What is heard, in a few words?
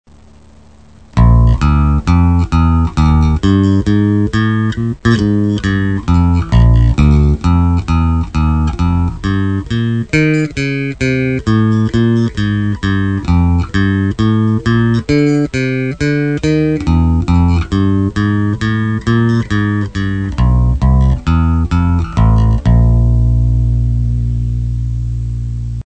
Bassgroove